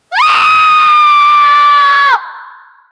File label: female scream morphing; layered